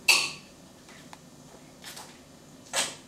幸せなキスの音

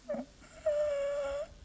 あっ、あっーーーーー(電子音)

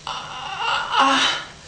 「アァァァァ、アッー…」

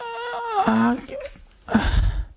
「アーーーーー↑、アーイクッ、アーッ…」

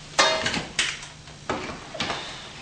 何かを落とす音

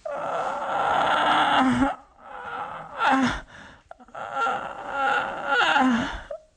「ァァァァァァァァハッ… アッ… アアン…」

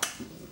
チュパ

